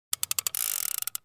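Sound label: revolver cylinder spin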